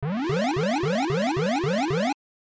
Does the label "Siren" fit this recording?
Yes